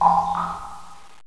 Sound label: drip, ambience